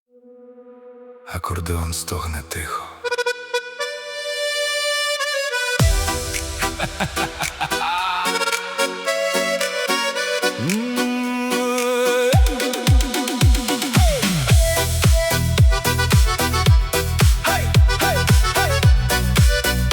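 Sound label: Ukrainian music for the car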